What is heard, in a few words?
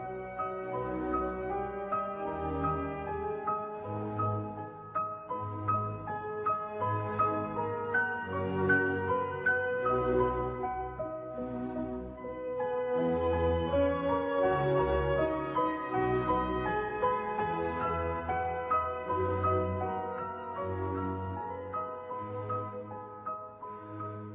Beethoven 5th